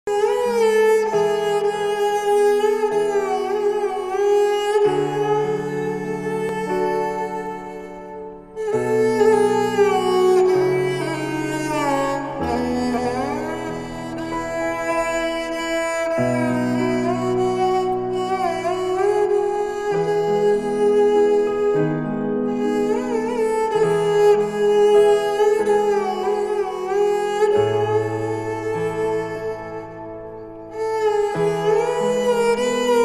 instrumental ringtone robindro sangeet ringtone

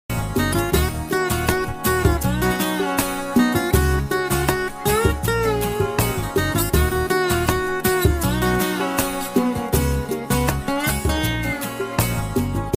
tamil sad

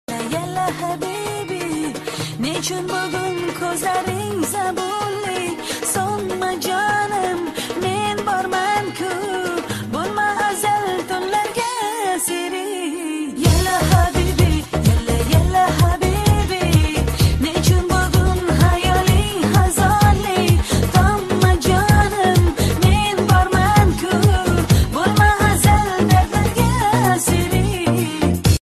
arabic ringtones

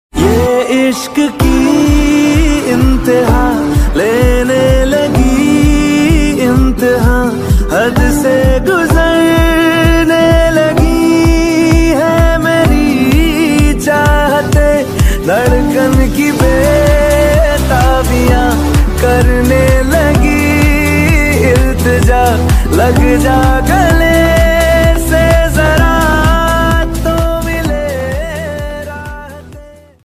song ringtone hindi sad love ringtone

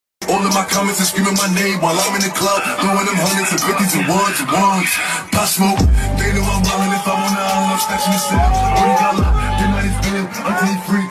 rap music; brooklyn newyork

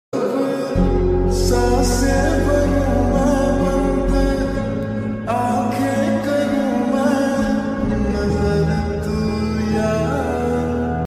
lofi song

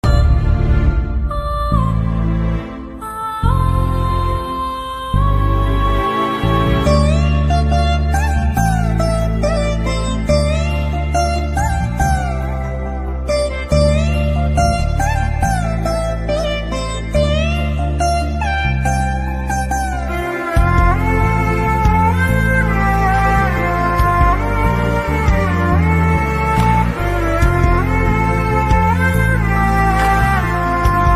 bgm ringtones sad emotional telugu love; khadgam

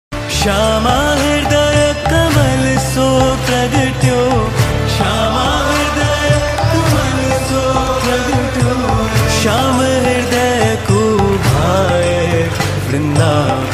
bhakti song ringtone; krishna song

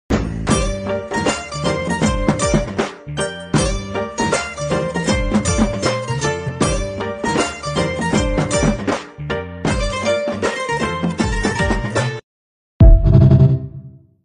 instrumental tune ringtone

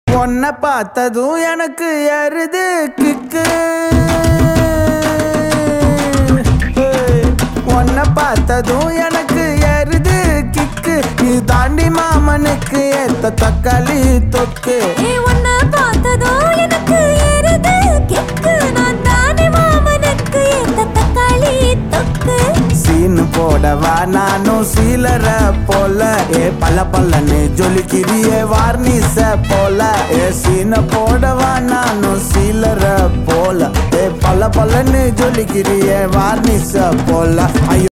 tamil song ringtone; Raayan movie

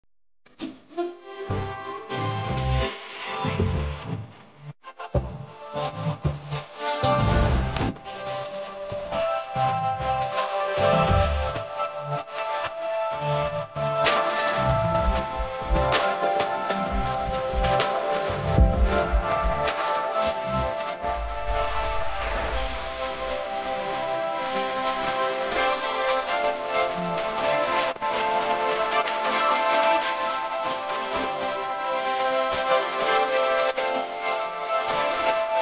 love ringtone

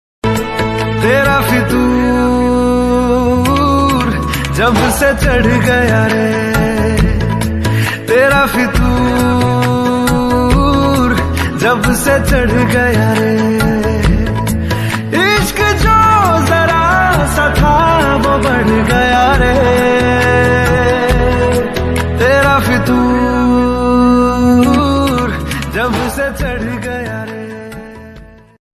ringtone hindi ringtone sad ringtone

